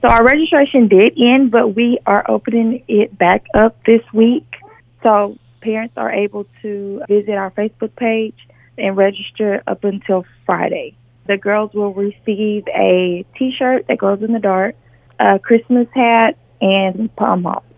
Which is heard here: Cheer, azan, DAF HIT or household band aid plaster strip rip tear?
Cheer